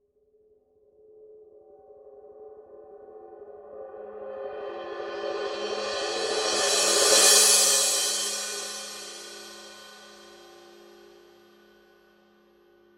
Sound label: rude 16 thin crash roll, paiste